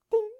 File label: bounce; Chelimbalo